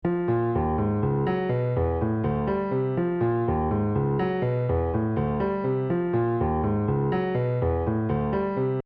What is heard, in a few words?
Counterclockwise fifths